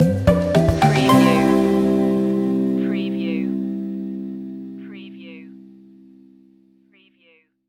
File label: charmed stinger